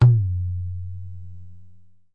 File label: pressed ge, Tabla; Small; ETHNIC and WORLD PERCUSSION